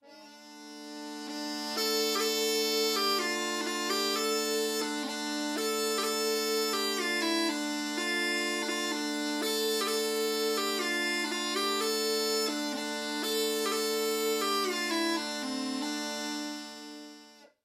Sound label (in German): Dudey, Dudelsack